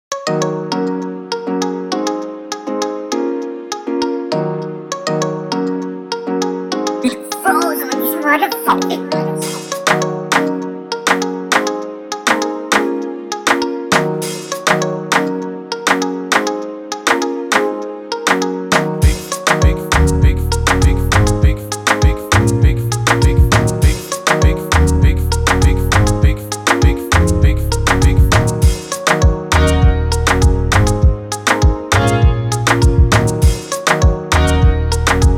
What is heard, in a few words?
Instrumental